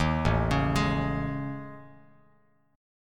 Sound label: A Major 7th Flat 5th, down Bass Standard; AM7b5 arpeggiated; JGuitar